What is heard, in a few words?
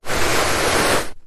household dustpan brush sweeping carpet single long slow sweep; zapsplat